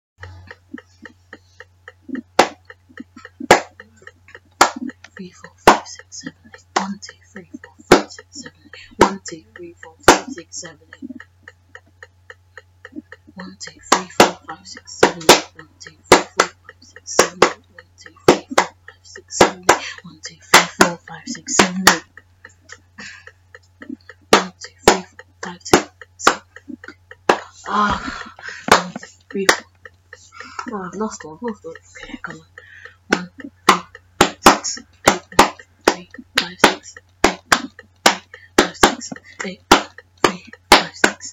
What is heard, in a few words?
clapping rhythms